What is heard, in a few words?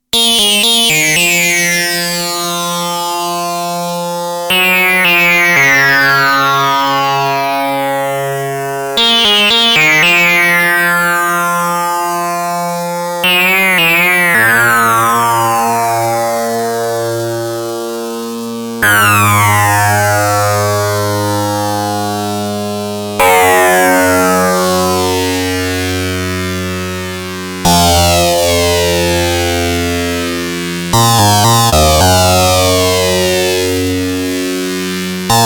Sync Sound; DominionX